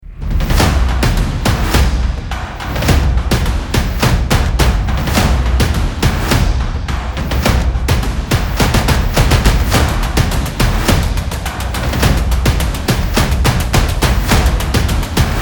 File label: drummers percussion drums